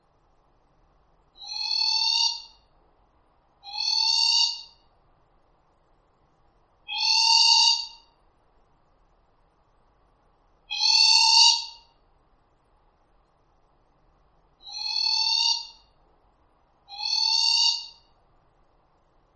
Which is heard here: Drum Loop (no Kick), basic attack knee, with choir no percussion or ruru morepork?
ruru morepork